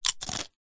human eat potato chip single bite crunch; zapsplat

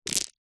necklace small stones pebbles set down carpet

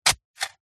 shovel metal dig soil single; industrial

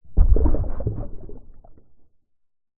foley heavy item place down underwater pov; zapsplat

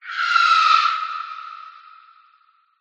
scream shriek descend reverb; sound design; Skyclad Sound

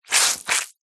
foley wet cloth squeeze squelch rinse; zapsplat

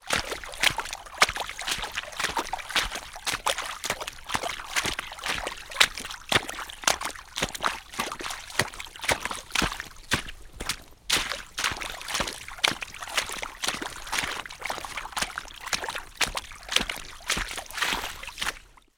footsteps walking puddle forest boots; foley; zapsplat

